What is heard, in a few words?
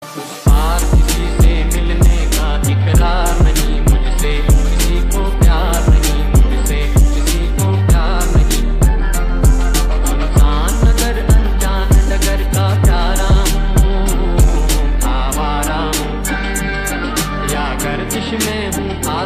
Trap Mix